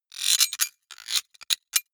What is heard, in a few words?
sci-fi electromechanics small stutter metallic